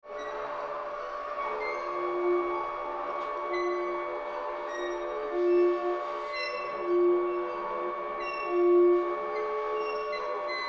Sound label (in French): harm tenue